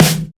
smooth steel snare drum sample e key

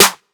clear snare sound g key

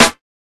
short reverb acoustic snare a sharp key

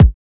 old school verby kickdrum sound c sharp key

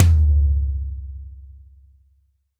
hi tom drum sound d key

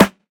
good steel snare drum sound g sharp key

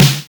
noisy acoustic snare sound C sharp key; snare drum samples

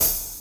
studio open hat g key

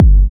studio steel kick drum G key

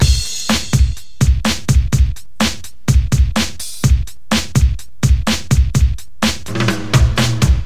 125 bpm modern hip hop drum loop c key

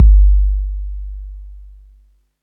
puffy kick drum sound g key

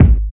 short bass drum single shot g sharp key

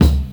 fresh smooth steel kick drum sound c sharp key; Duration 0:01; Samplerate 44100 Hz; Channels Mono

